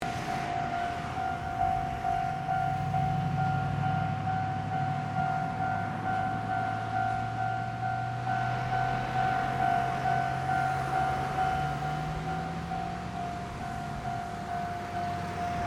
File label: train; Eoden